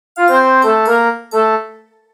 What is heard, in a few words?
loader